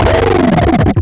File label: hard robot boss